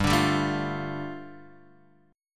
G 7th Suspended 2nd G, Guitar Open F; G7sus2 strummed

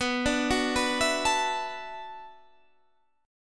B Minor 11th B down Guitar Standard; Bm11 arpeggiated; JGuitar

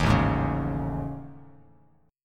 A Major 7th Flat 5th, down Bass Standard; AM7b5 strummed; JGuitar

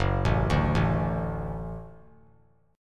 F sharp Minor Sharp 5th F sharp; arpeggiated; JGuitar

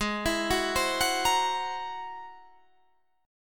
G sharp Augmented 9th G sharp

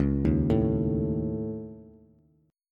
Db Suspended 2nd Sharp 5th Db Bass; arpeggiated; Dbsus2#5; JGuitar

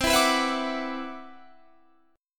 C Major Sharp 11th, Guitar Standard; CM#11 strummed; JGuitar

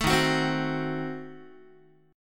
Eb Diminished 7th Eb down Guitar; Ebdim7 strummed; 4A,4E,5A,5D,5G#,6C#; JGuitar

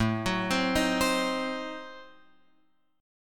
A Diminished 7th, Guitar Open F; arpeggiated; JGuitar

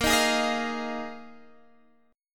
A sharp 7th Suspended 4th A sharp; A#7sus4; strummed; x,13,13,13,16,13

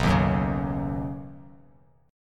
B Major Add 9th, Badd9 chord, Bass Standard; strummed

